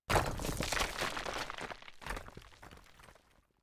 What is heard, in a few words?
skele hand attack; loyalty and blood viktor origins